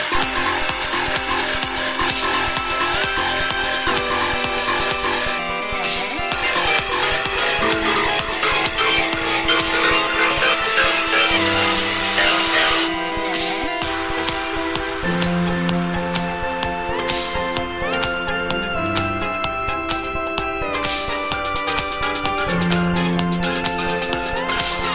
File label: Ringtone; Samsung Galaxy Tab S7 Fe